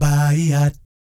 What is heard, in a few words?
BAYEEAT, Vocal Planet Jazz Fx; Spectrasonics